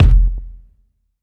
warm steel kick drum f key, Royality free kick drum single shot tuned to the F note.